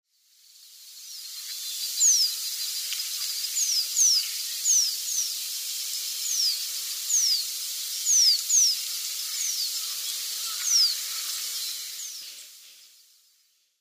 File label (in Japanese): tsurisugara; saga